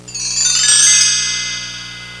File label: bell chime, percussion instruments; Sound Effects for Multimedia - Volume 1